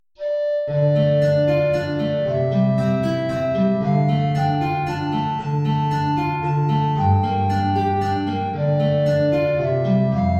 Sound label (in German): Altflöte & Gitarre, hohe Notierung; Es kommt ein Schiff, geladen